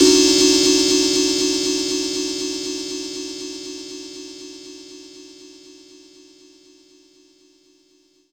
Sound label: Old Organ; Zero-G Jungle Warfare 1, 2 & 3; 90 s Sample CDs